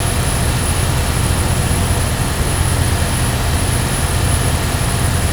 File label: bike idle; Star Wars Knights Of The Old Republic II; XBOX